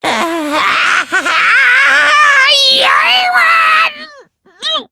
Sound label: transform; monokuma